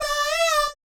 BAYEEAT, Vocal Planet Jazz Fx; Spectrasonics

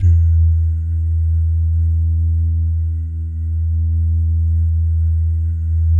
"doo", Vocal Jazz Fx

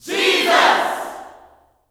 "Jesus", Vocal Gospel yell shout; Spectrasonics; Vocal Planet Vol.1 Gospel; akai

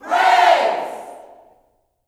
"Praise", Vocal Gospel yell shout; Spectrasonics; Vocal Planet Vol.1 Gospel; akai

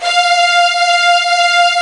KURZ F4

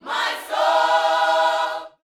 Vocal Gospel PHRASE

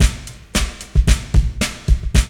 LOOP; E-Lab; X Static Goldmine 2; akai

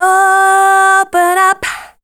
voice spectral, ly short; Best Service; Voice Spectral II

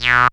SYN TON; E-Lab; X Static Goldmine 2; akai

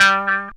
GTR PIC; E-Lab; X Static Goldmine 2; akai

